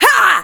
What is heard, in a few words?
grunt